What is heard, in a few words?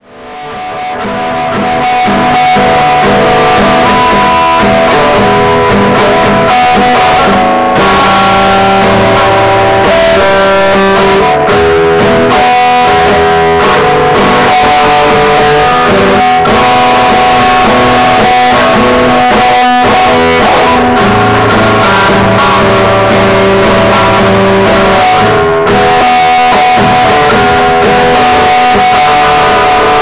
guitar electric